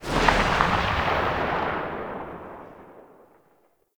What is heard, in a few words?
Archgun Tail; Warframe; Weapons; Archwing; Tn Nokko Archgun